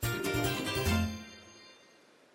item get; Legends Arceus

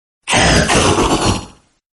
cry

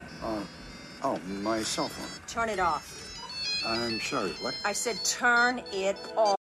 turkish march

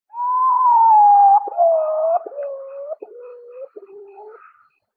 potoo ahoo hoo hoo hoo

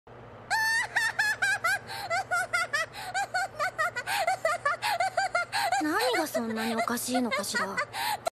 laughing; neptune; neptunia

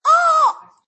oooooooooohh

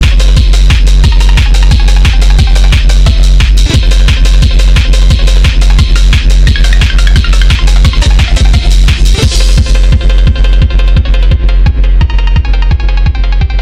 phonk

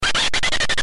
noid digital laugh

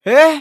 hehhh

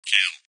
kill spirit box; phasmophobia

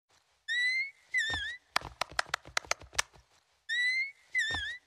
yay emote; fortnite

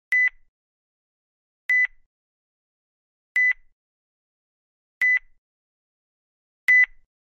nissan chime

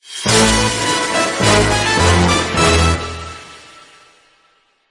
finished puzzle; shantae half genie hero ost

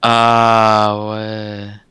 aaah oue

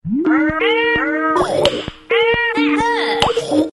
skype; micheal rosen

